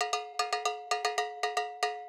Acoustic Percussion

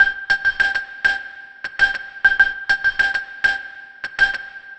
Processed Guitar, Loops; Guitars

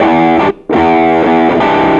Electric Guitar, Loops; Guitars

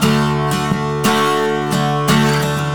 Acoustic Guitar; Guitars

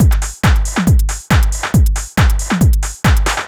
Dance Drumloop; Drums; Dance Beats

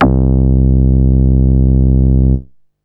BASS; SoundFactory; SMPMANIA

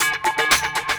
Cymbal Loop, Dance Beats; Drums

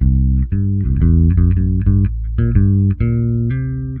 Electric Bass; Basses

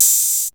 HIHAT; SoundFactory; SMPMANIA

